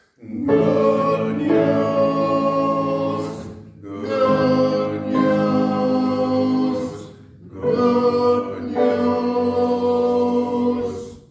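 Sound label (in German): Männer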